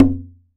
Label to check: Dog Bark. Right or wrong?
Wrong